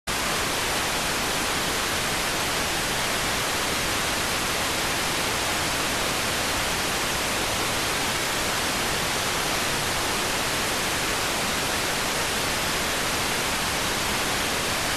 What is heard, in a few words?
rtty rx